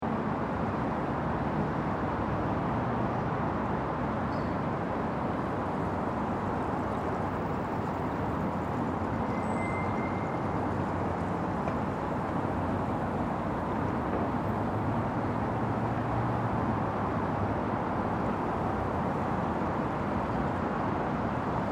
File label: Traffic Subway Slussen, Busy traffic center in Stockholm, Sweden; traffic including occasional subway train, faint voices in swedish; Traffic And Car Sound Effects